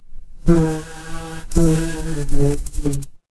animal insect

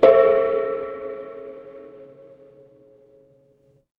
instrument gong

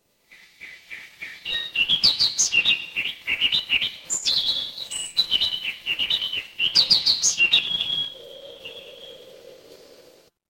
ambience forest

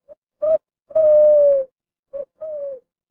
animal owl

